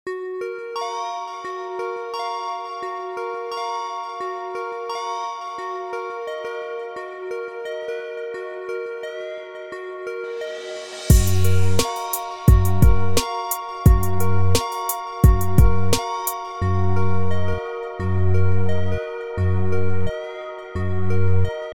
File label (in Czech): melodii beat; k vasemu zpevu